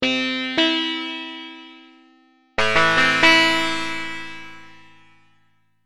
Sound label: Sitar